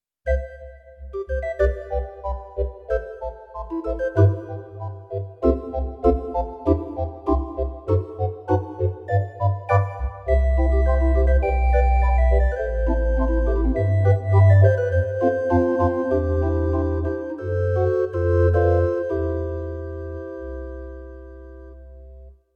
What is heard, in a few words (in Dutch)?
Kerkorgel, Roland FR-8X V-Accordion; church organs